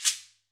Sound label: Ahfuche; Club-50 - Foundations Roland; Ahfuches